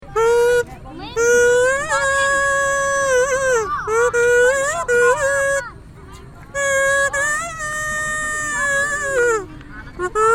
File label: causeway kazoo